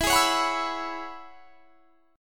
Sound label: E 6th Add 9th E down; E6add9; strummed